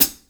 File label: HH PD, Drum 1 Dry; East Collexion